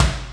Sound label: Kick, Yamaha RX5 drums